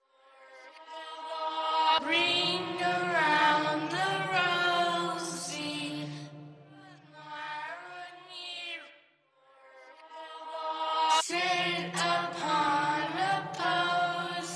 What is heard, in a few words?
Ring Around The Rosy Horror, Vocals Only